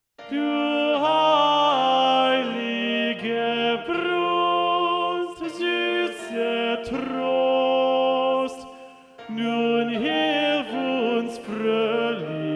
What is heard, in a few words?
motet tenor